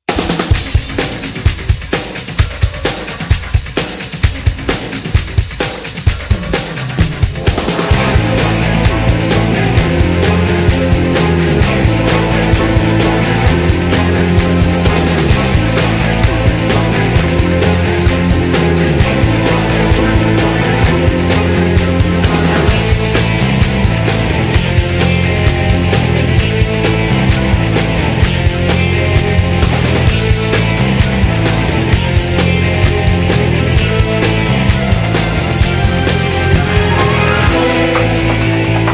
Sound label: Mission Industrial (Without Choir)